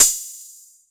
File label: TR606 Hat, Roland TR-606